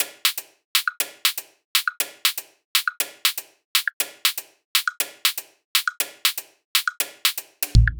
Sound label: Top Loop 120; Loops; Daze; Ghosthack; Drum Mega Bundle Demo; Abstract Percussions Volume 4